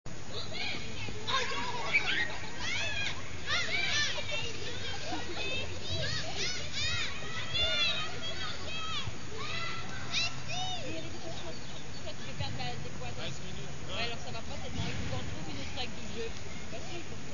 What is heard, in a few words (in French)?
ambiance enfant